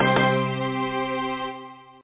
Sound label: complete